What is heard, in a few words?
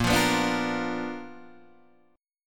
B Minor Major 7th Flat 5th A sharp